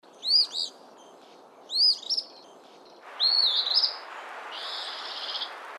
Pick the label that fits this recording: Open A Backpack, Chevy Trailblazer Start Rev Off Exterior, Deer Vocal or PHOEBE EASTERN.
PHOEBE EASTERN